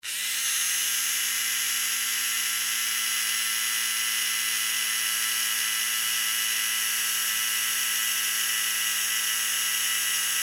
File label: Electric Motor Small Run Long Steady; Machines; Big Room Sound